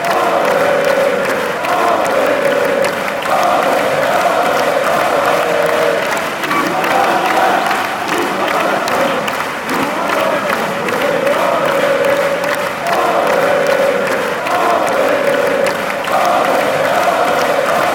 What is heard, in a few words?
ole germany, chants